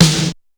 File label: snare drum gate; SNAREDRUMS